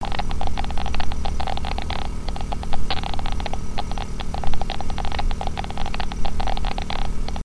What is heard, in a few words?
reactor active; factorio; UnrealisticReactors